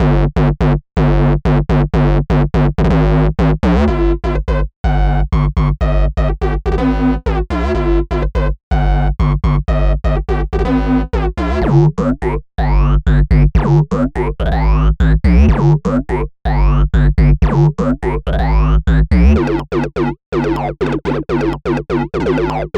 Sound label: Big Bass; Flow